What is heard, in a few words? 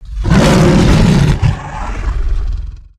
mchimera attack; STALKER; customnpcs